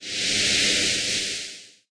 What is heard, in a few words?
Breath